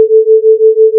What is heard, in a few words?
beating